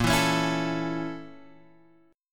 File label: Bb Minor Major 7th Flat 5th, Guitar, Open G